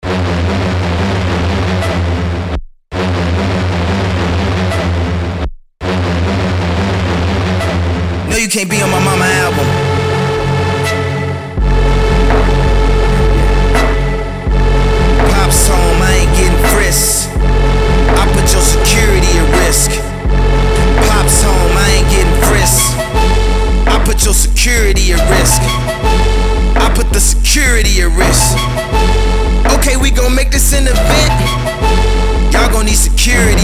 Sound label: Looped Drums